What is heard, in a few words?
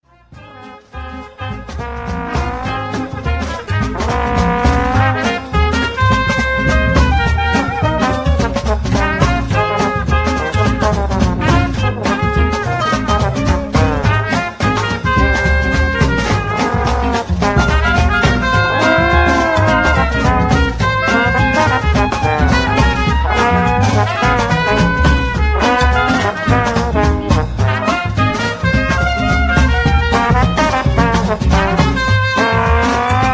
Brass